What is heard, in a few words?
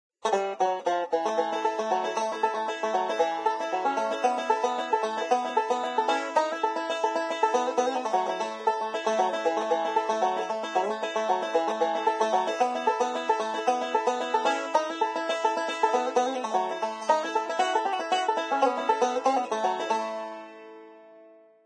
Banjo; Zomboid; Lifestyle